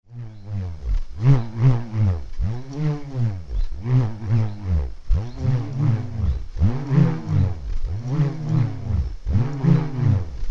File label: Bullroarer; ancient instruments